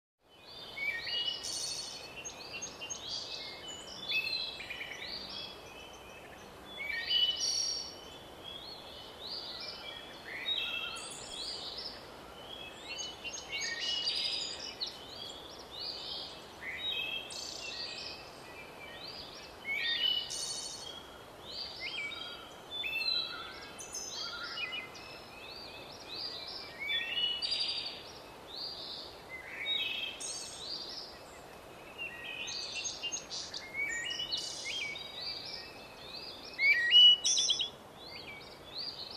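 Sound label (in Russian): лес день легкий ветерок в деревьях птицы звуки среди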